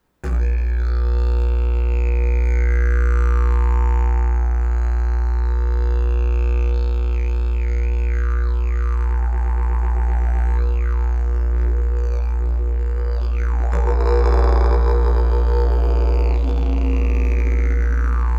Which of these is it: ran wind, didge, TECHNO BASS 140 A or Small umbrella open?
didge